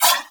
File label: Nunchaku Prime Whoosh; Warframe; Tenno